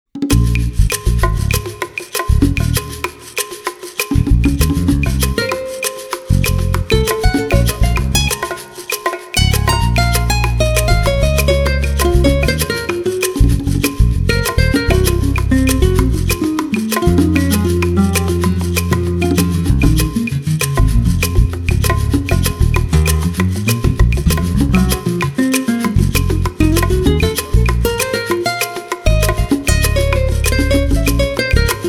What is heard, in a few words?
Spanish Guitar